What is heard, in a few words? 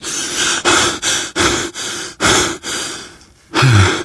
exertion major, survivor voice, manager